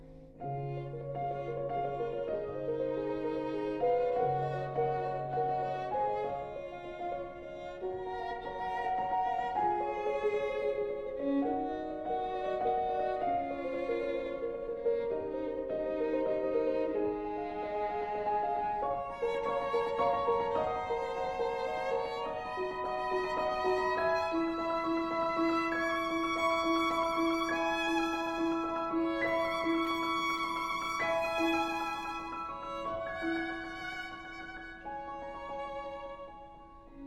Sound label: Violin Sonata; Janacek